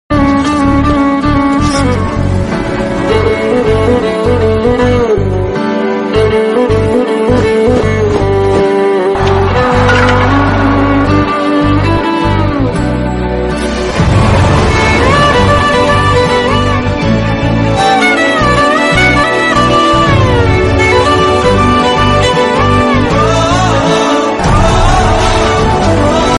telugu bgm telugu movie bgm ringtone; jersey

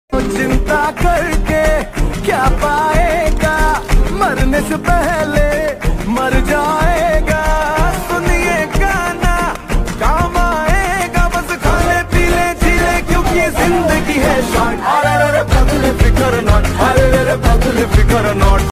slowed and reverb song lofi hindi slowed reverb

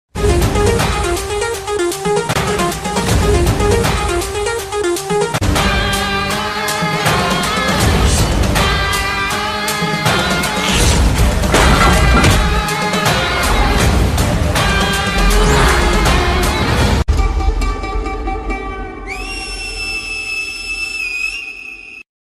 bgm ringtone telugu bgm; Seetimaarr; Gopichand

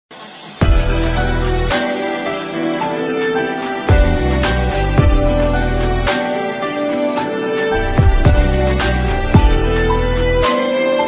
background music ringtone instrumental; chennai express

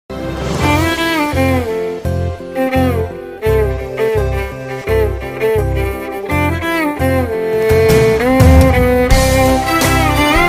violin version

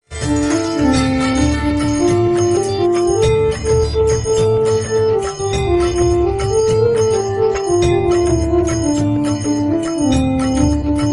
piano cover instrumental; radhakrishn

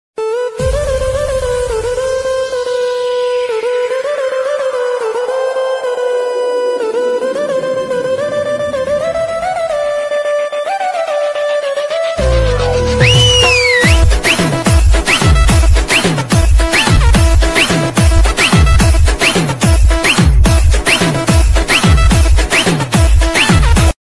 marathi ringtone new ringtone

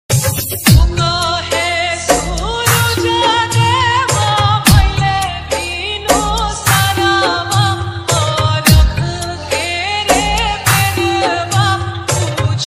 chhath puja dj song

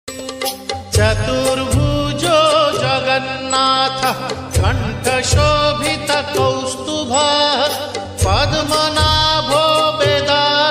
jagannath bhajan ringtone

